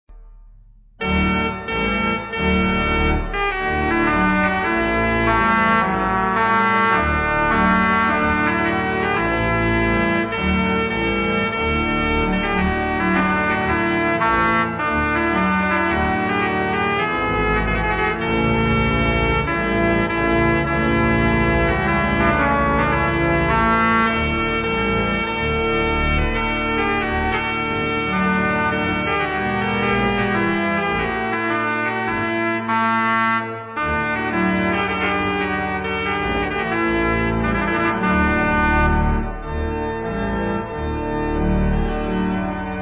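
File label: Trumpet Tune, organ; Henry Purcell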